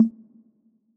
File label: Tom Electronic; Drums